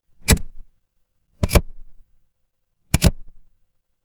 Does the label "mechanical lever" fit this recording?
Yes